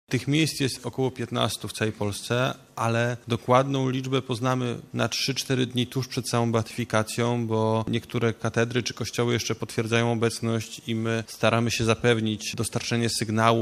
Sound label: beat